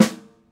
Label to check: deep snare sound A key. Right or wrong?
Right